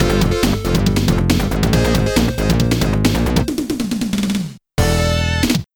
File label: Jingle Zone Clear; Metal Sonic Ver.; Time Extend 3 From Virtua Racing Deluxe